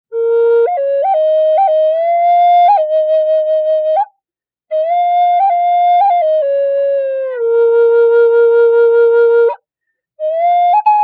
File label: Medium Bb Sassafras, flute; Flutes